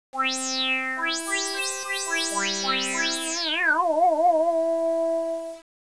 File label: modulation